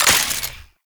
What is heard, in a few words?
Belt Fed Rifle Mech Stop A; Warframe; Tenno; Frumentarius